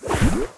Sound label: ashring attack